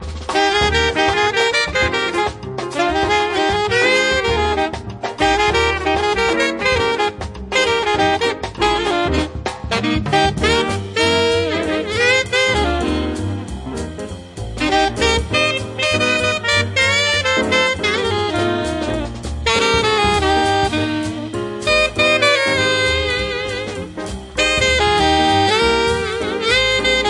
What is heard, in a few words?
Swingin The Samba